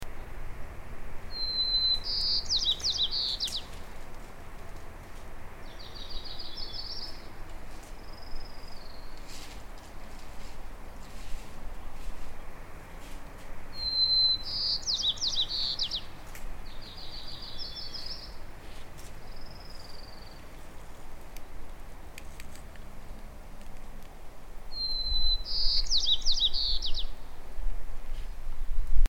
White crowned Sparrow Zonotrichia leucophrys pugetensis